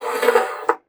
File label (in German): PUSTE, bassoon; Xsample VOL-6